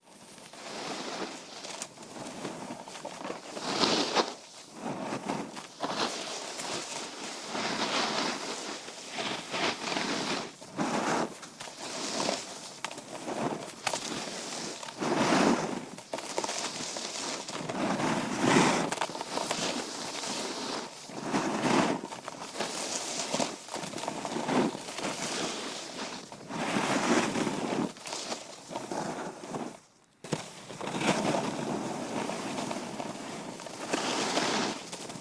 snowboarding snowboard downhill turning carving snow ice crunch stop jump land landing; jumps; fvtt sonniss gdc audio